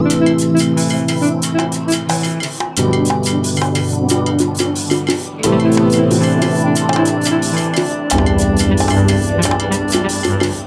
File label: Smooth Latin Loop